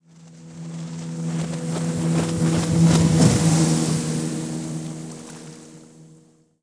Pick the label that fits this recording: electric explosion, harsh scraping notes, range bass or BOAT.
BOAT